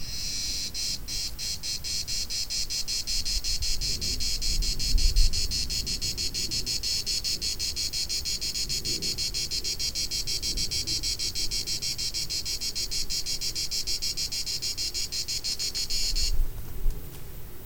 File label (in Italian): estate cicala; Cicala diurna 2; Soundscapes